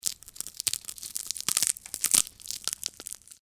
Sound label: crackles